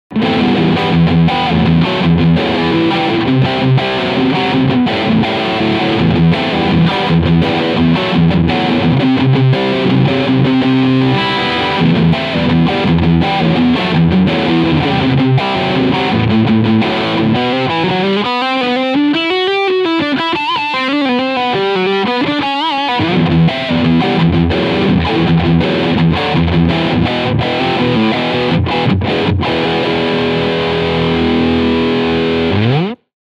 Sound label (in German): Solar Guitars SB1.6 Riff Sound Steg Humbucker